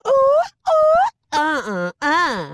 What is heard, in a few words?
Woman Dance Happy; Zomboid; Lifestyle